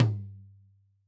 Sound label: Tom Dry